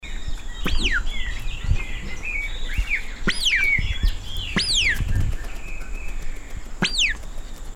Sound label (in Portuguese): mutum de penacho, canto